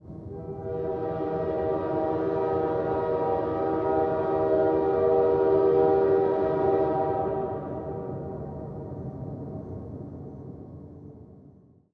distant train; E-MU Producer Series Vol. 3 – Hollywood Sound Effects; Ambient Sounds

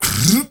male solo, male noise; Heart of Africa; Spectrasonics; Heart of Africa 1

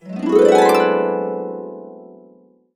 D, Harps

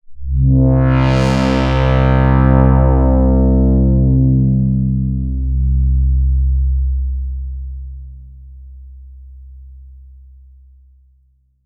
pad c2, Synths; Polyend; Simulacrum Sounds; 1 Shots; ARP 2600; Pads

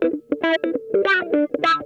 MAD CAT, Funk Guitar; Zero G - Funk Guitar

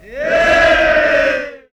CALL; Optical Media International; Sonic Images Library; SI FX Vol 7

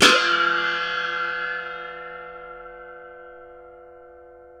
NEPAL WAH, Asian Cymbals

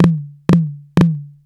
hi tom flam, raw

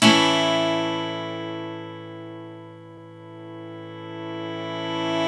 GTR, Dual Ac Chd; Club-50 - Foundations Roland

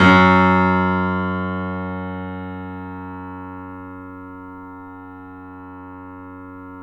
Piano; InVision Interactive - The Piano

